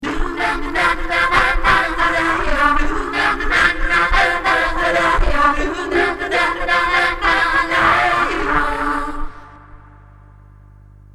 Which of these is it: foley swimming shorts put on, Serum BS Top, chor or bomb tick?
chor